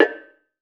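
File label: BONANG, Metalophones, Pitched Percussion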